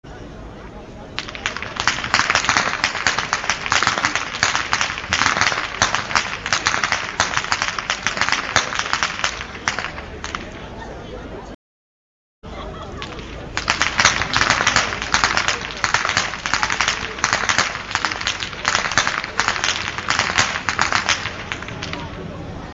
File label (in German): schnalzen, jugend; roth